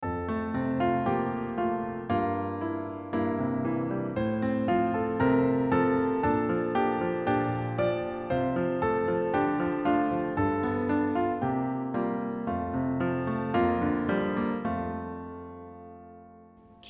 singing

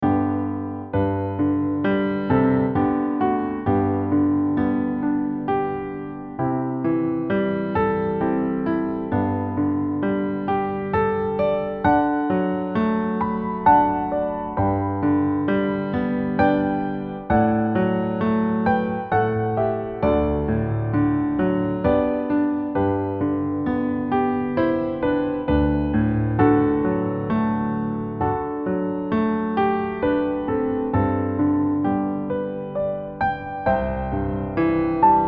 instrumental

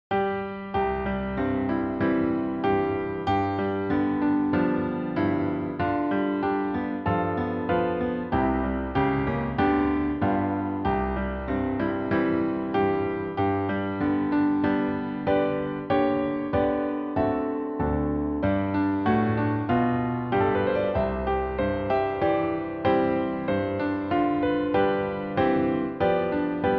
America the Beautiful piano

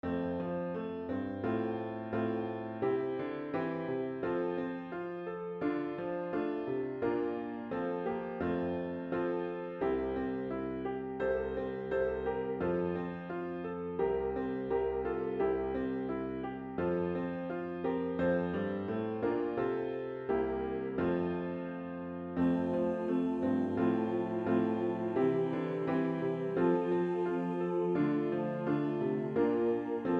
instr for recording

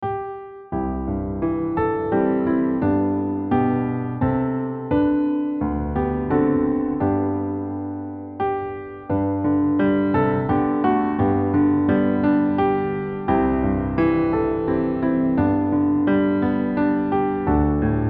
piano solo

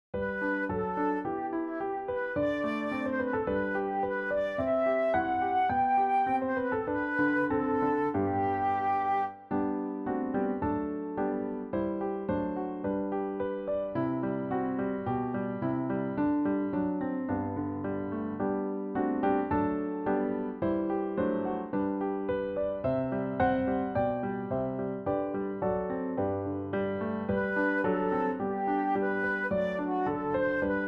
Medley piano and flute

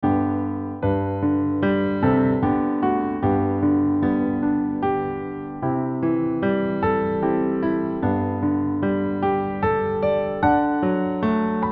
instrumental

